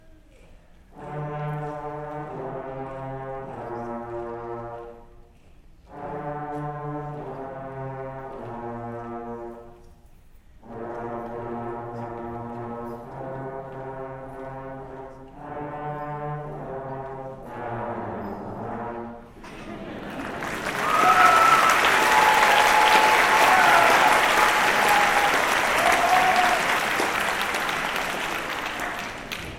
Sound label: Trombone Feature; Beginner Winter Concert; Knight Band